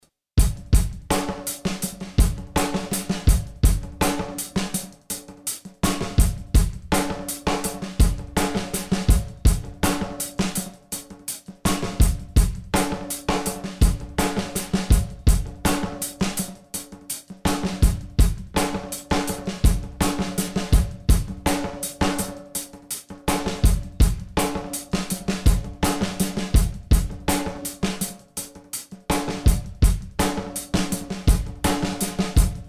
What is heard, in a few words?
Drum Loop